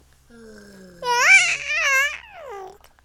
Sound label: Baby Cooing